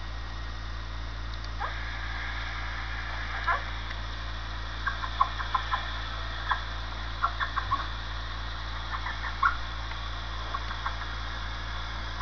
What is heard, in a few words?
Kisses